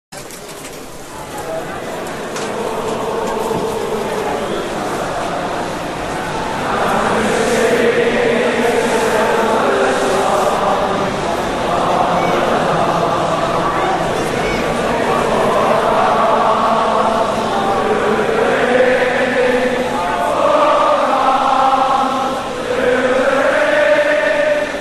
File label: Liverpool, Chants